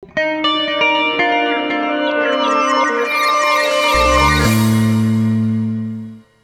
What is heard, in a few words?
harvest tone